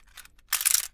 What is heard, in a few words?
gun mechanism strong; soundmorph